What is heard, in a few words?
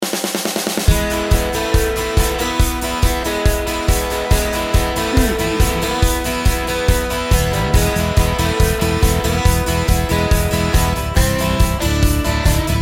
showtime rock